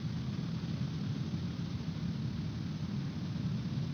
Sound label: Ambience Loop, outdoor